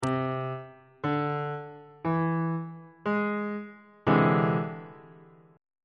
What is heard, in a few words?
f flat augmented 7th 2nd inversion on bass clef